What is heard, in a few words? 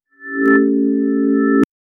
ultra engaging soft, chimes